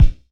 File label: Floor Dusty Wood, Kicks; Airwindows